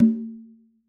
conga Single Hit Left Hand; Raw Perc One Shots; Slate; SD SEISMIC Drum Sample Pack

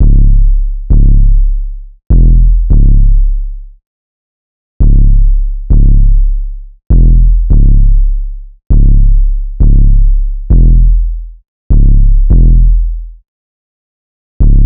808 loop 100 C#m; Slate Digital BASSHOLE Sample Pack

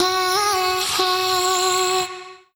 phrase F#, Vocal; Slate Digital Omnivox Vocal Sample Pack

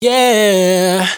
riff Oh Yeah Long B, Dry Vocals; Raw Vocals; Slate Digital Omnivox Vocal Sample Pack

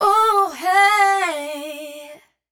riff Bluesy D wet, Vocal; Slate Digital Omnivox Vocal Sample Pack

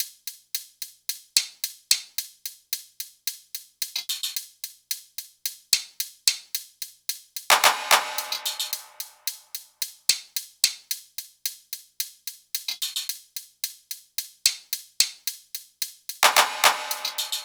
perc 110, Drum Loops; Top Loops; SD MIDNIGHT Sample Pack; Slate

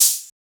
hat splash, Open Hat; Hip-Hop; Drum One Shots; SD CAICOS Hip-Hop Sample Pack; Slate

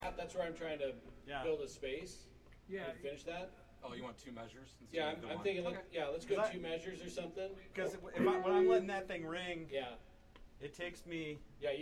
Room Mic

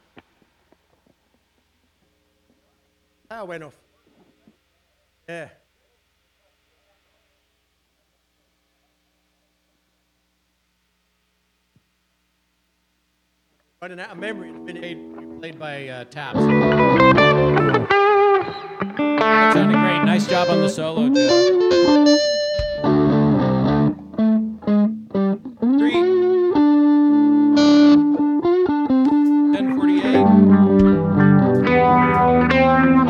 Strings Emphasis

